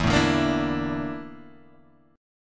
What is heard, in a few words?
Bb Major 7th Flat 5th